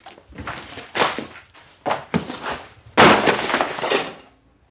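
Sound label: Book Thrown Off Shelf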